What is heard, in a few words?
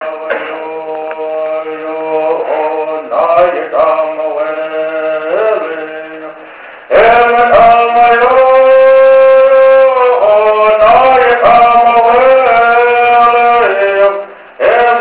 Bird